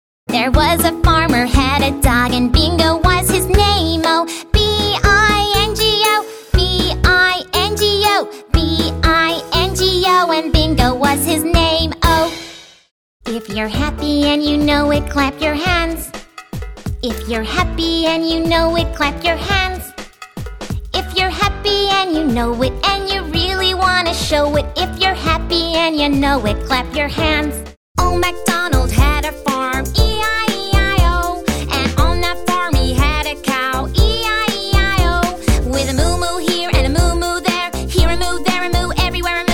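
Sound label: American English, songs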